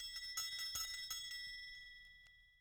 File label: bell ringing; angband